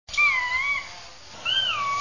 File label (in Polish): kureczka karolińska